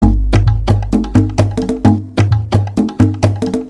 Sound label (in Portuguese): atabaque gravudo